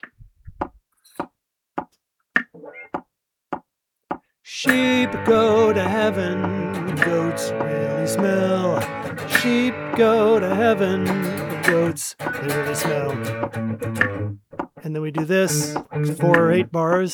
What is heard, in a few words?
Sheep Singalong Section, acappella